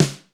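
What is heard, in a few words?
LIT SD, snare drum; LITE KITS; Crypton; DrumBase5000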